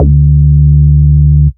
Bass D, Root D

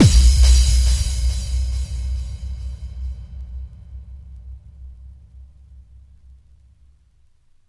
bassdrum reverb FX kick; Vengeance Samples

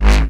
Offbeat Bass G#; Vengeance Samples

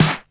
Snares & Claps; Vengeance Samples

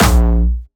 A#1, Jumpstyle Kick; Bassdrums; Jumpstyle Multis; vengeance essential club sounds-3; VEC3 Bassdrums

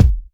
Sub Perc, Bassdrums; Vengeance Samples

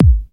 Hard Kick; Vengeance Samples

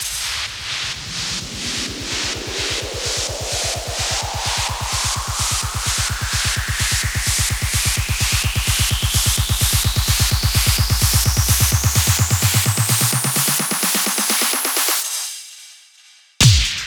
128BPM Style Control, House Electro Fills; 8+1Bars; Vengeance Samples

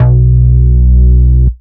Root B, Ultimate Bass; Vengeance Samples

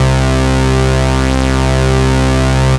Basses C; Vengeance Samples

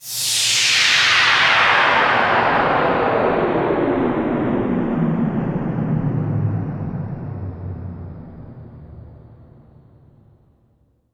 Downlifter

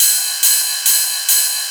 Ride, 140 BPM; Pitched; Vengeance Samples; Vocal-Hook Kits; Song Kit; Break The Walls

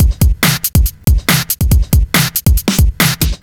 Breakbeat; Vengeance Samples; VEC2 Loops

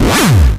Acid Sounds F#, Synths; Vengeance Samples

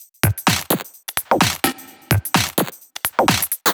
Drumloops 128BPM

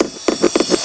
Loops Fills; Vengeance Samples

